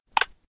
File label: place block